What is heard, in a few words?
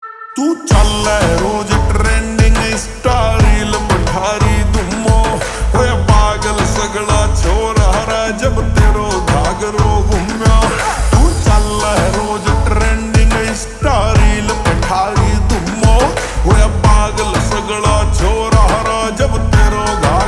Ringtone Slowed Reverb